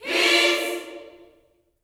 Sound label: PEACE CHD, Vocal Planet Gospel GOSP CHORD; Spectrasonics